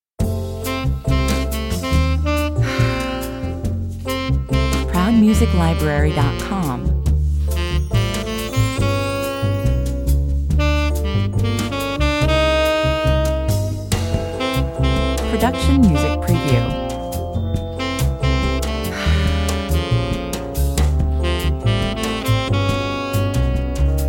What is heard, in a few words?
bossa 140 bpm